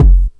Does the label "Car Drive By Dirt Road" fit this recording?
No